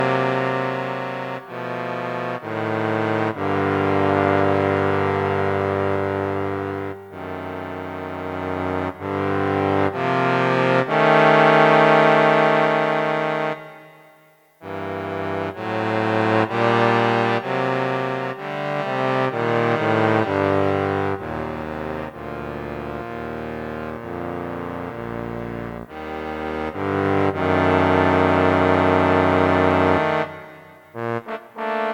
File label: euphonium; INSTRUMENTOS MUSICAIS